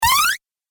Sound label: Arcade Game Laser Rising; Video Game Sound Effects; Retro Inspired Sound Effects; Think Nintendo, Atari, C64